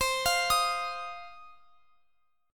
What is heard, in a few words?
C Minor Double Flat 5th C down Guitar Open F; Cmbb5; arpeggiated